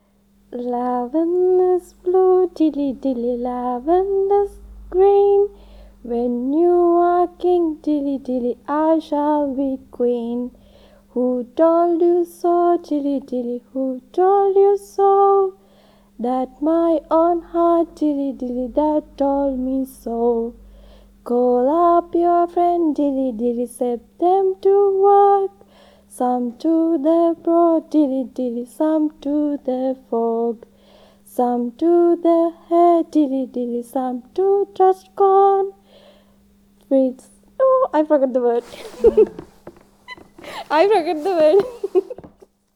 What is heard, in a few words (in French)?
Cinderella chanson anglaise